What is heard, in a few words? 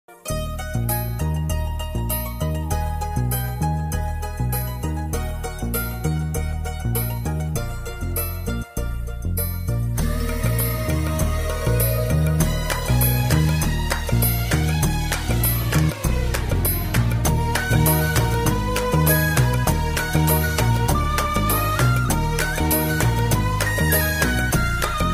bgm ringtone flute tone; kadhalar dhinam movie